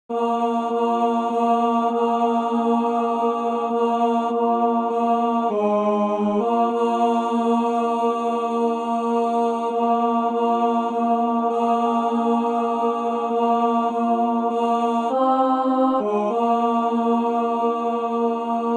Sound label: Song of Peace Descant